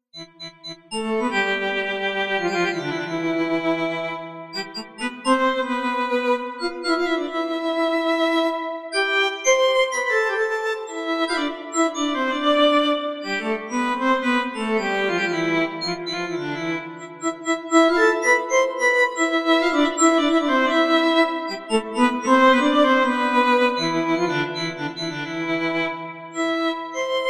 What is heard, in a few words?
Violin flute glockenspiel